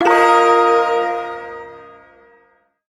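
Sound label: Shawzin Pentatonic Minor Chord; Warframe; Duviri Ersatz Shawzin; Chords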